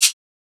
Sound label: perc scratch; Drum One Shots; SD MIDNIGHT Sample Pack; Slate